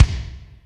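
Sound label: kick Spike Hl, Alesis D4 drum machine; 300 Drum Machines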